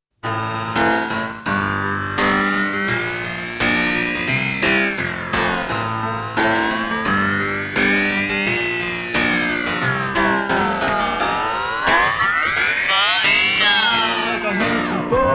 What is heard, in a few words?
song jam